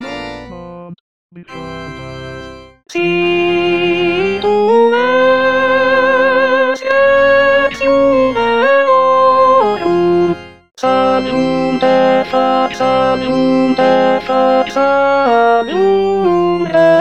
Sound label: alto